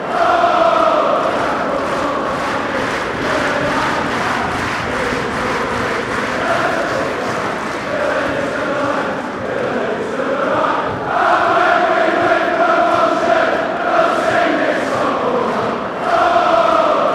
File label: fanchants